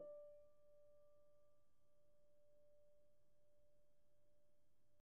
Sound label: piano pp, steinway